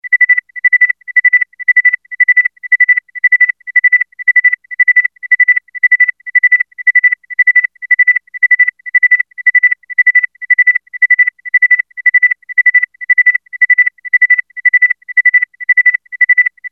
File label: electric cicada